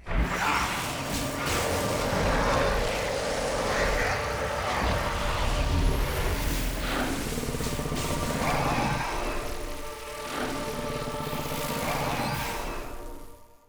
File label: character Big Win Loop; netent